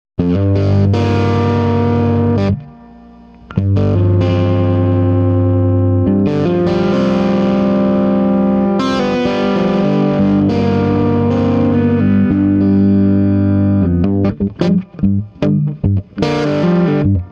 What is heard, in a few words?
Swart Amp